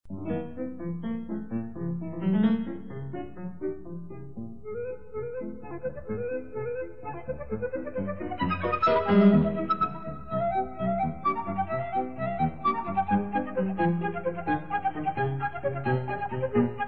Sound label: Flute and piano in Re Major; Serguei Prokofiev; Opus94 2